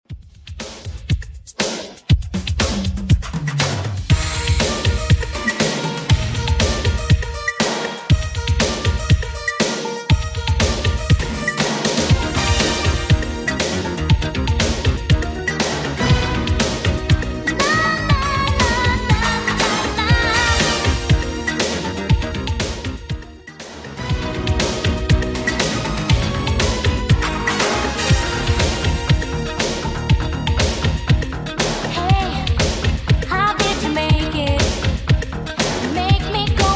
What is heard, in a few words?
80s Redrum 120bpm CLEAN